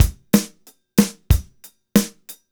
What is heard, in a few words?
185 DRUM, Mediteranean Traditions; Soundscan vol.53; AKAI